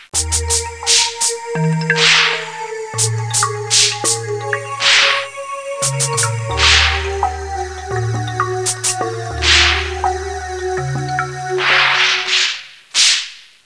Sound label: Spooky